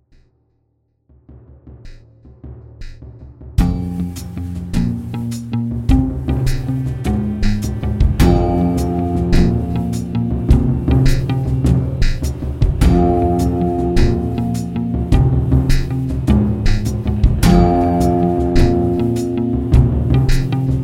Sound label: ens perc; worst western